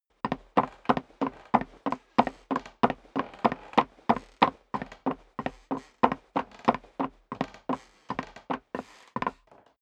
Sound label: step boots wood creak run sequence; Footsteps; schritte